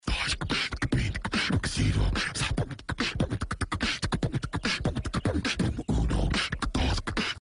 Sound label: beatbox